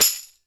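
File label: PERCUSSION TAMBOURINE; Sonic Reality; Interactive Drum Kits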